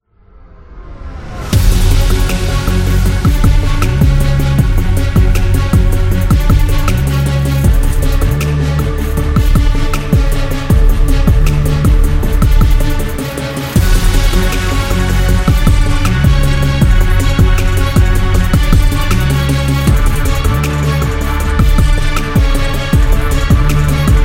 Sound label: Hip Hop Orchestra